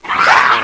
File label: demon pain